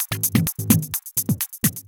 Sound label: Electro Loop 128 BPM; Vengeance Samples